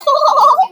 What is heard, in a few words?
laugh